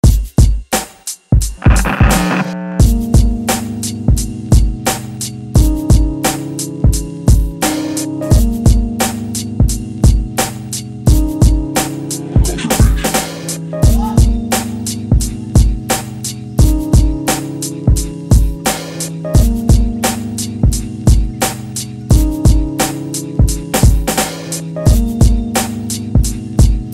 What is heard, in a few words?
Hard 87 BPM Old School Boom Bap Type Beat